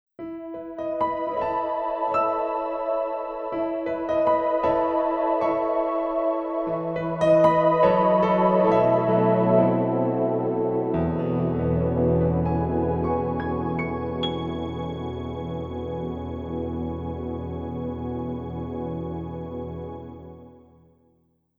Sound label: piano pad